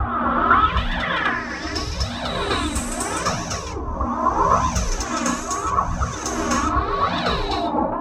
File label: NRG SFX, Trance